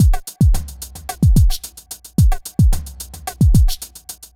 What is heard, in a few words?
r n b kit 110h